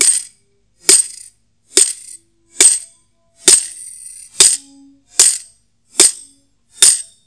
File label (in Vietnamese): Sênh tiền, Quẹt